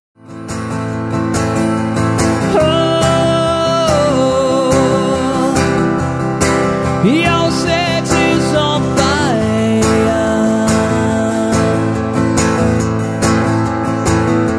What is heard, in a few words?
Acoustic Guitar